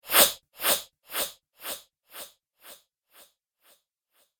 Definite Swipe With Delay; Whoosh Sound Effects

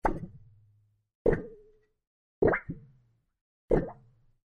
Low Pitched Stereo Cartoony Effects-4 Takes; Cartoon Sound Design

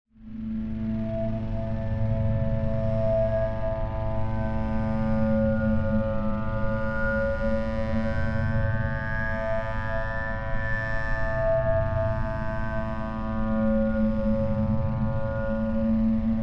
Drone Feedback Swell Pulse; 5.1 STEREO MIX; Blastwave

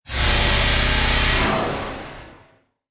Laser Cannon Discharge; Laser Gun Sound Effects

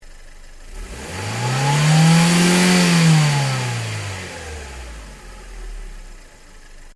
Rev Engine Bay, car; Hyundai Accent 2008; Hyundai hire car; Vehicles

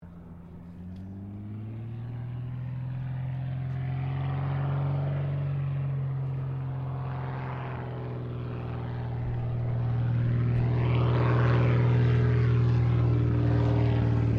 AIRCRAFT CAC CA-25 WINJEEL LAND, fighter trainer; RAAF Winjeel fighter trainer; Airplanes (Sound Effects:Vehicles)

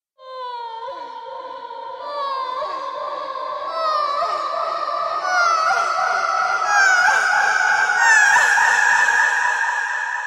Screaming Surreal Horror

